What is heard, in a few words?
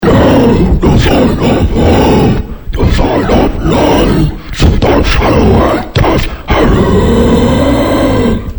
death vox, death metal vocals